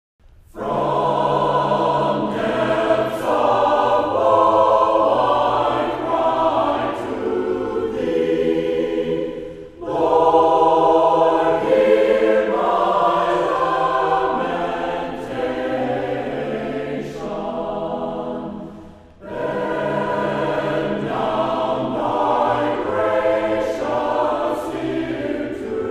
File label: Choir; From Depths Of Woe I Cry To Thee